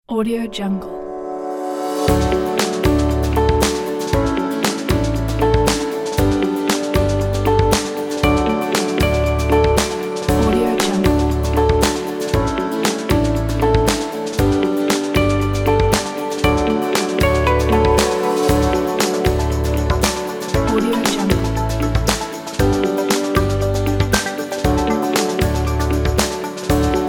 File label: on motivate kit